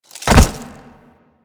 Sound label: Capt Kneel Down Foley; Capt Vor; Warframe; Tutorial